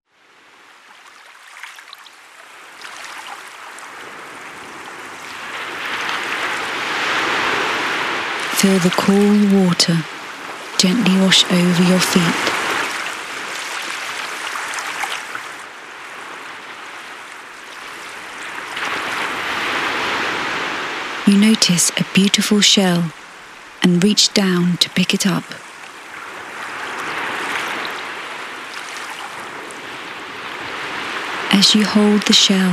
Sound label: Desert Island